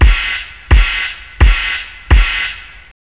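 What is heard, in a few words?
DRUMS CYMBAL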